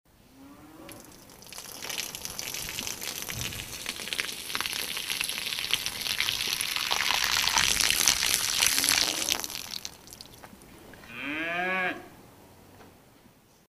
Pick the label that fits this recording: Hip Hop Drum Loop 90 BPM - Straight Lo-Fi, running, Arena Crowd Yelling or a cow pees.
a cow pees